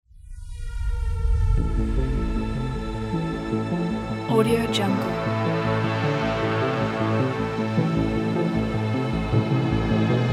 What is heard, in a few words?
cyberpunk sci fi trailer